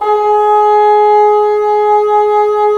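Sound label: WND BN, wind bassoon; LA Composer vol. 3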